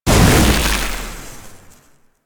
Portal Transition Open To Stone

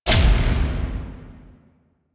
Shotgun Proj Die; Weapons; DE; Warframe; Tenno; Pod Shotgun

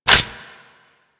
Dual Pistol Mech 2D; Grn Queen Guard Dual Pistols

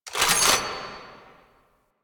Rechamber; Assist; Warframe; Nineteen Ninety Nine; Quests; Quincy

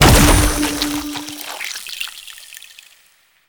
Power Shroom Proj Explode; Warframe; Nokko; Empowering Mushroom

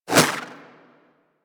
Throwing Star Whizzby; Warframe; Weapons

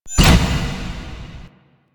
Stairs; Warframe; Entrati; Vaults

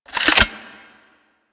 Four Barrel Rifle Mech Load

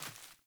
Sandals Run Vegetation, Footsteps

